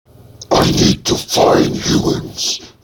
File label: idle, overlord wizard